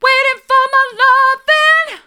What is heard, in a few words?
waiting for my loving hard, vocal